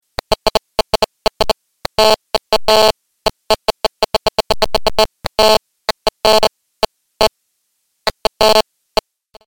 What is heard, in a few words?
gsm noise